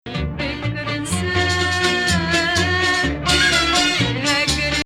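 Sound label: Mustaar, maqam; Rast; Musta3ar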